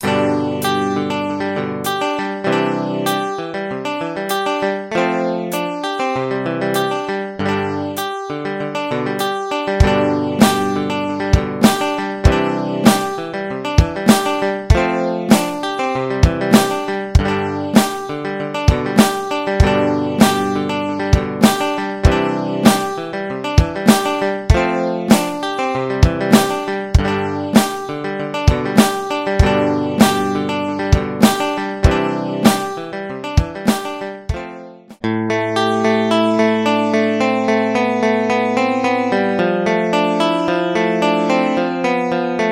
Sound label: Semi Acoustic Electric Guitar; GuitarTempus; Syntheway